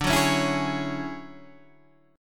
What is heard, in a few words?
D Major Sharp 11th D Guitar Half Step Down, chord